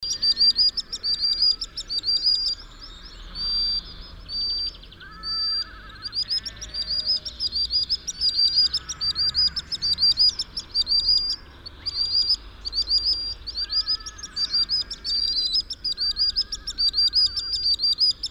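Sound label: perevozchik golos, common sandpiper voice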